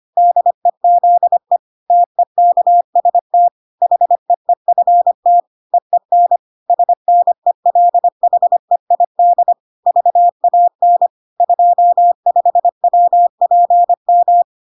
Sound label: 25wpm